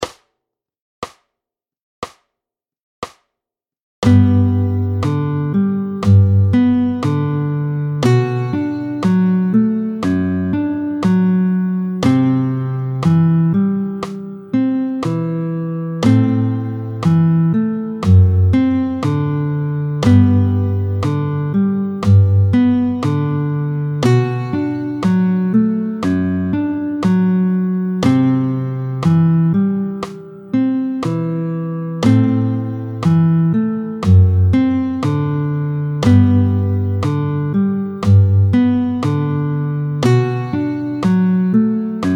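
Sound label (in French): tempo 60, L'anatole, tempo 60, guitare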